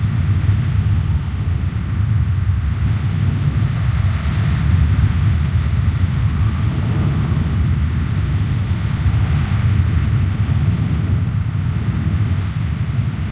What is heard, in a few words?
wind, wasteland; hl2